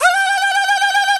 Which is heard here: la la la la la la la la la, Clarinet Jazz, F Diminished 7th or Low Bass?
la la la la la la la la la